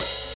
natural open hat a sharp key, open hi hat tuned to the A# note